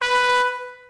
Trumpet tone; LEGO My World First Steps

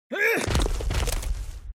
Trample Start; Goddess of Trampling

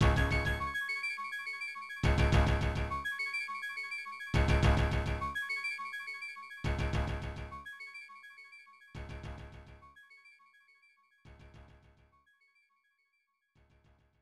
Stage 3 Start; The Punisher; arcade 1993 Capcom; Harbor

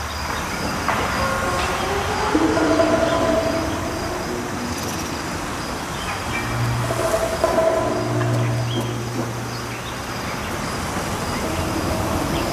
Ambient City; Angry Birds Trilogy; xbox 360

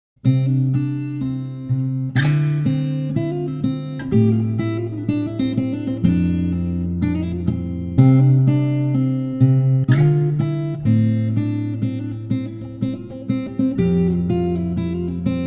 Guitar Song; Kayeligh's Guitar Song; Cassette Beasts Original Game Soundtrack 2024

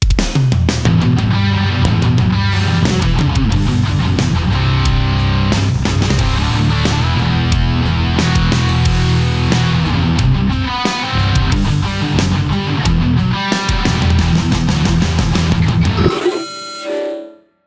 Prog Metal Track; Fortnite

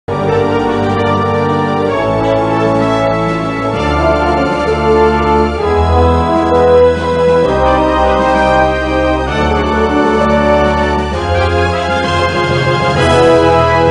Combat Music; wing commander iv the price of freedom ms dos ps1 windows gamerip 1996

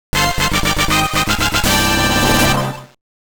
Level Up!; super robot taisen original generation 2; gameboy advance

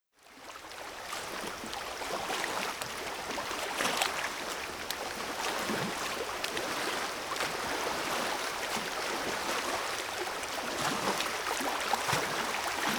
Water Flowing; Shimano Xtreme Fishing; Wii

